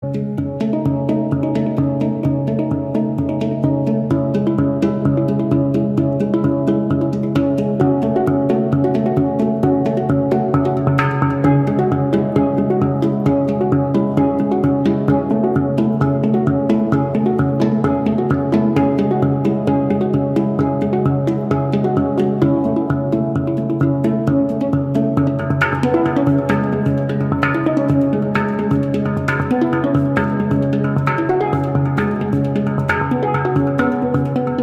Handpan

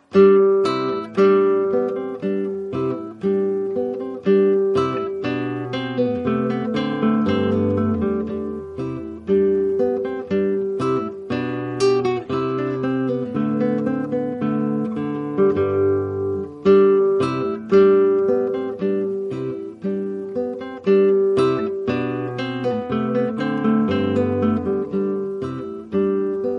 Guitar

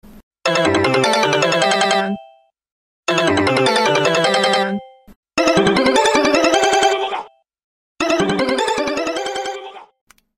Sony Ericsson; Wednesday Addams

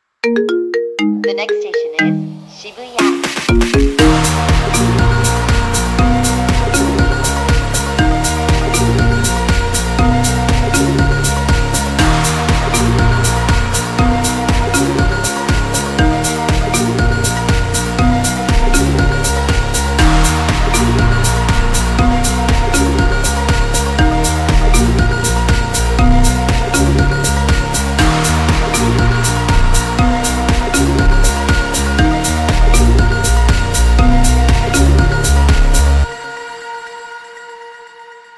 Marimba; The Next Station is Shibuya

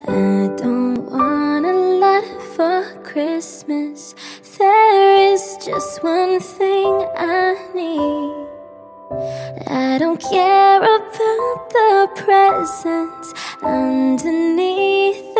Acoustic

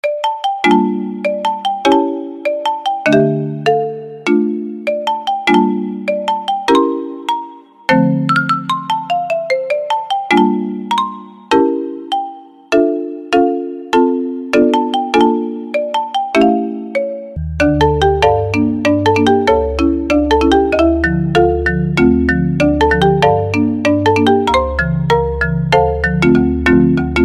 Marimba; Bunny Girl Senpai

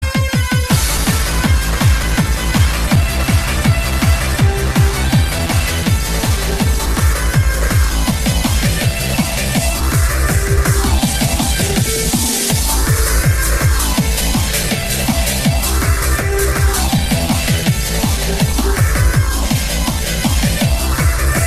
Bagpipes Techno Remix

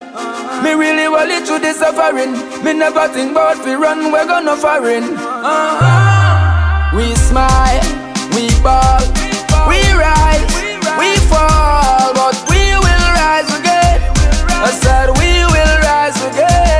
BUGLE

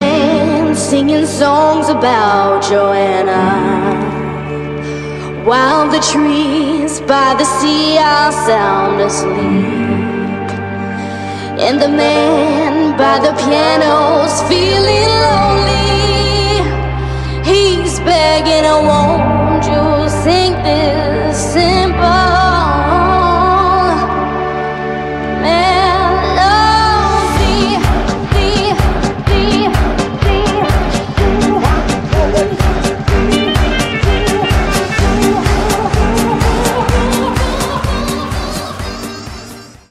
Trombone

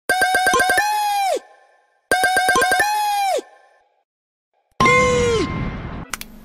Siuu Messenger; Cristiano Ronaldo

